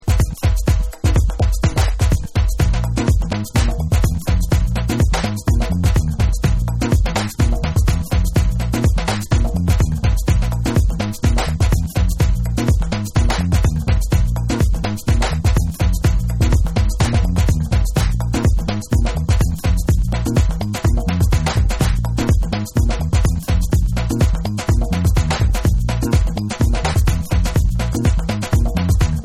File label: Instrumental Dub